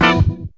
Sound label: ORG RIF; E-Lab; X Static Goldmine 2; akai